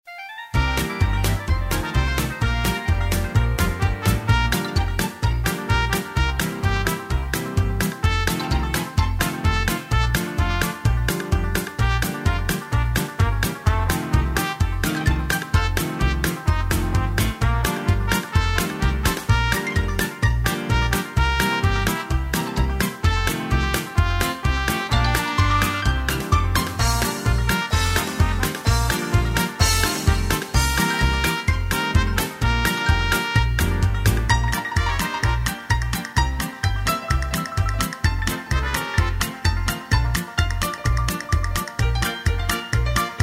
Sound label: music